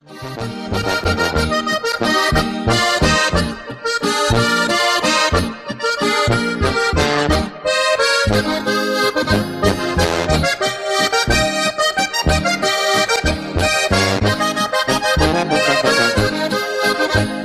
cool polka

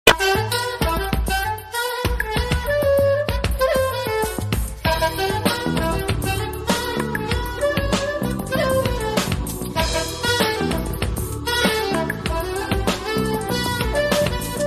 sax instr; ringtone

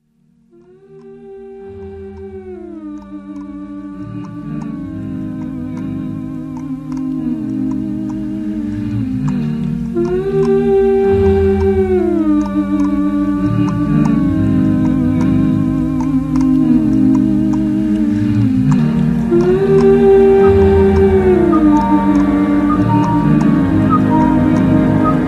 bird alarm clock

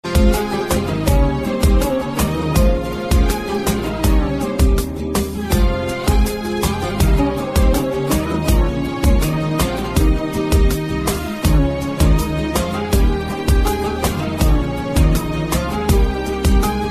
awesome tone

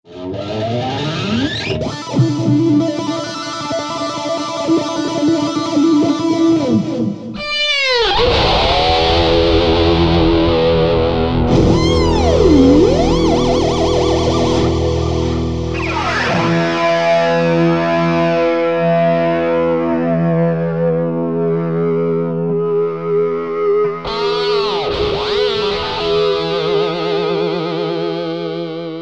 electric guitar

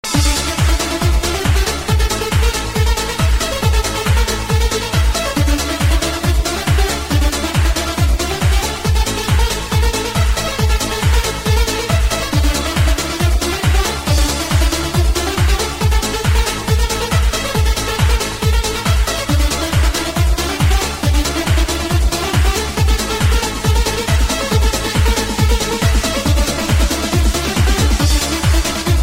hardstyle mix

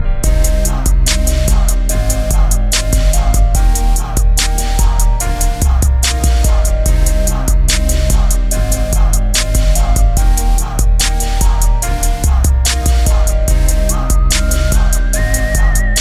instrumental remix

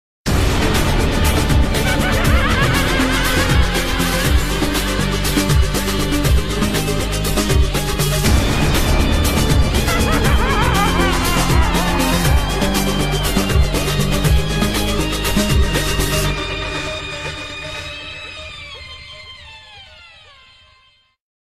game over; call of duty black ops 2; green run

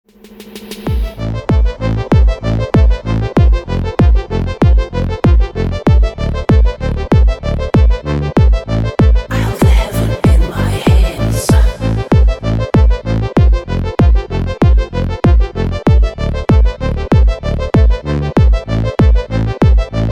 slow, ringtone

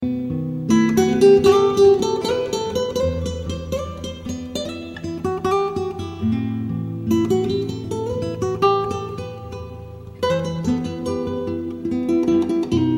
sad guitar

